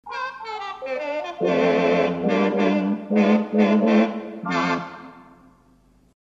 4' Tibia 8' Brass Sax, theatre organ

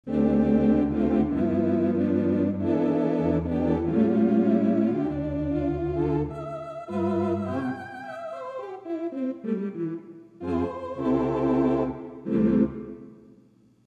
8' Tibia 8' String 4' Tibia, theatre organ; A slight 'fleshing out' or filling out of the above registrati

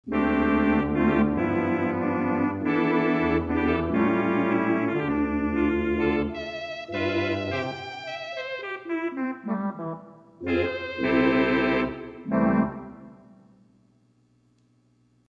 8' Tibia 8' Tuba, theatre organ

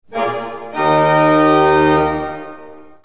gt full, theatre organs

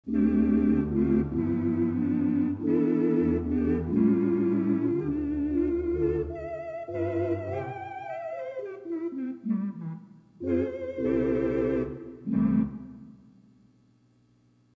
8' Tibia 8' Vox, theatre organ

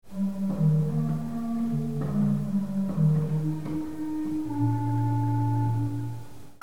ped bf, theatre organ; a big keyboard, played by the feet

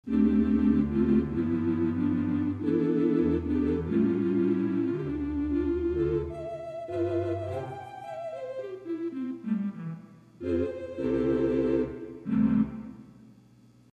8' Tibia 8' String, theatre organ; The complex tone of the string adds a different dimension.

